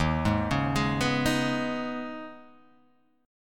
Eb 7th Suspended 4th Sharp 5th, Guitar, Half Step Down